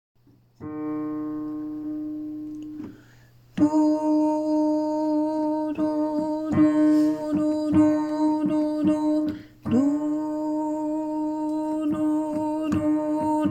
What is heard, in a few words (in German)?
bass part a and b1